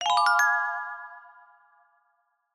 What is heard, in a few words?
vote Chime